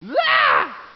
Pain; KKND

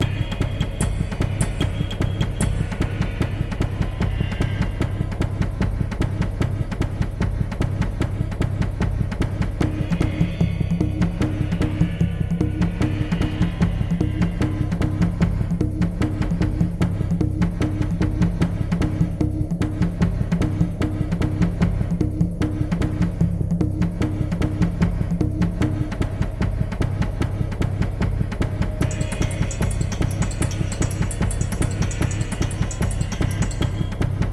Village

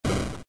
Bomb Blow; LOZ

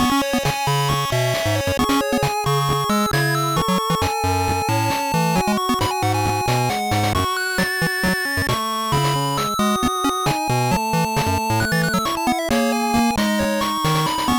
Unused Song; Olympic Summer Games GB

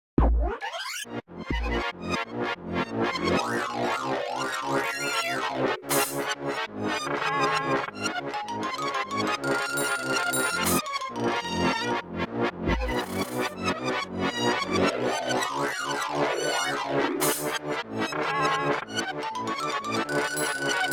Portrait ghosts, jp; Luigis Mansion

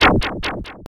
swing; Sonic Mania

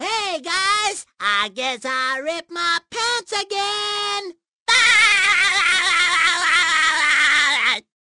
ripped pants; Supersponge

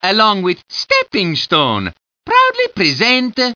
fun; Mario's Game Gallery (Mac OS Classic); Stepping Stone

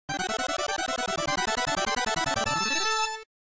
stage clear jingle; Raimais

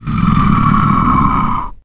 minotaur; Daikatana

